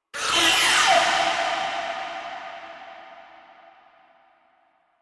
Transition Horror Whoosh Slash Scary Metallic, Transition Metallic Whoosh Slash Scary Airy; Vocal Horror; Sound Design; Whooshes; BBFX